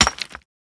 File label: Hit Rock; GrandFantasia